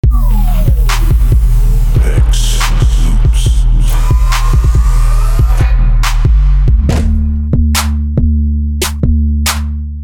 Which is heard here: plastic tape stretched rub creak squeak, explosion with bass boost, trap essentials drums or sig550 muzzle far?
trap essentials drums